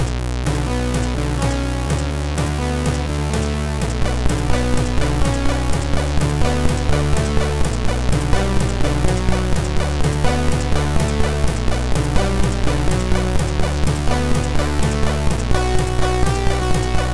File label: Digi Box, MOS6581R3; Sony